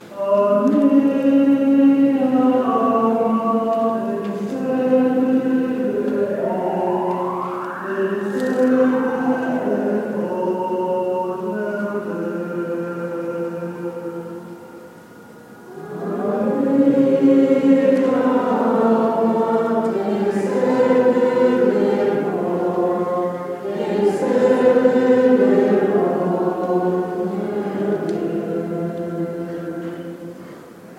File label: catholic church choir; windhoek